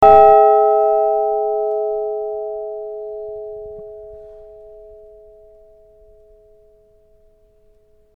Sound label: fire truck